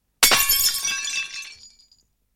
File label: Glass Breaking; openFracture